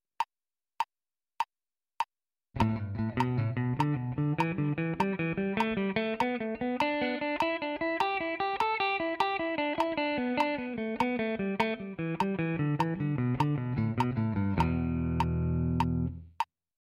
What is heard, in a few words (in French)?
Gamme pentatonique mineure brisée triolet